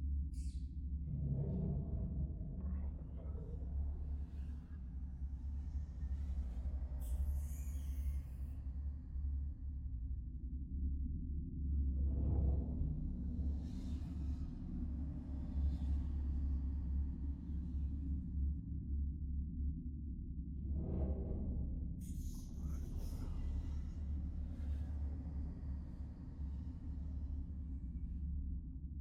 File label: amb catacombs; noxus